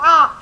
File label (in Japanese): karasu